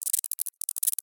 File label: small species voices skitter squeak phrase; microbes; sonniss gdc